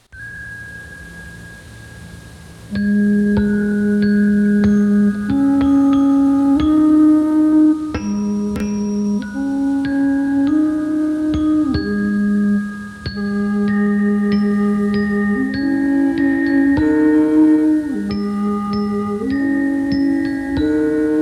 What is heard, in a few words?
Canon (Musical Round)